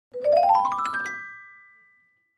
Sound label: Clavichord Ascendin; keyboards